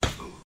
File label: Bullet Hit Body